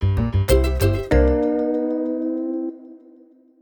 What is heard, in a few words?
end stinger